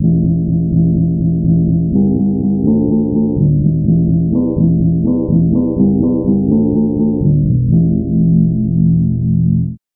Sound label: tubular bells bass